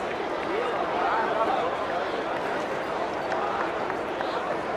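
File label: BMX Crowd Ambience Idle Loop; Crowds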